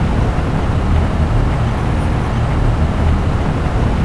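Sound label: mine catwalk loop; doors elevators; Star Wars Obi-Wan; XBOX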